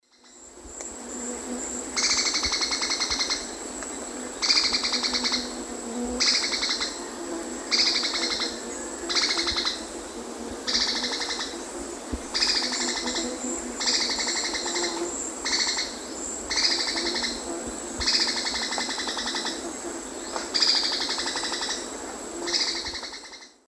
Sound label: Schistocichla leucostigma, alarm call; S. America; Eastern Lowlands